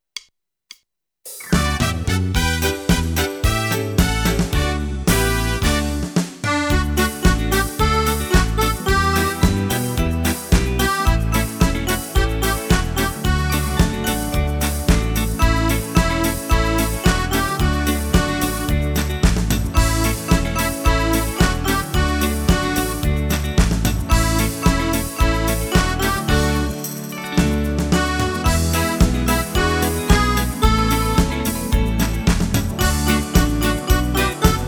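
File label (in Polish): wersja instrumentalna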